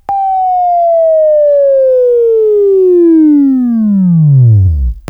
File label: Buzz; Labview-Program; Sweeping Training program